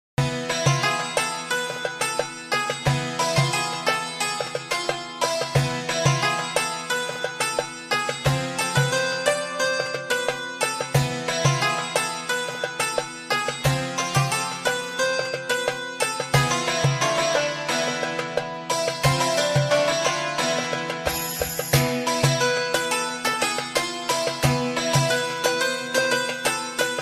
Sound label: Arabic Desert Music